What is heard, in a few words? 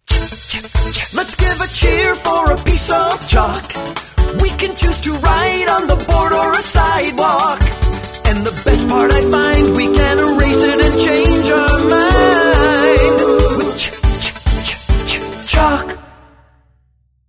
Ch Song Chalk; kindergarten grade 1 2 letter sound